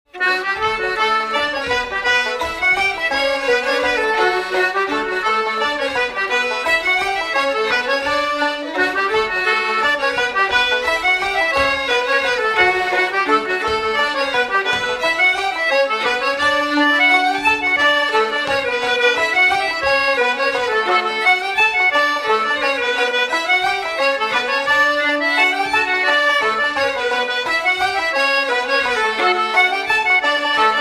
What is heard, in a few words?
Mazurka; John Dohertys Mazurka